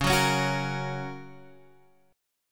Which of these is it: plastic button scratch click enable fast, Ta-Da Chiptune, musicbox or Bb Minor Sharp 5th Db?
Bb Minor Sharp 5th Db